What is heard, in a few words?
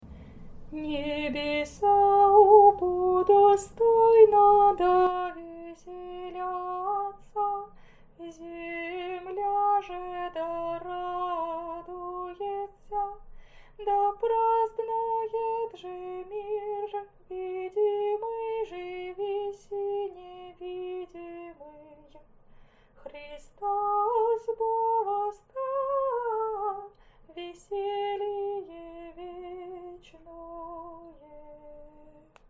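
easter canon byzantine slower, singing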